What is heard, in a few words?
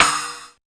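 Fairlight IIx CMI Crash cymbal; 300 Drum Machines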